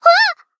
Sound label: combo break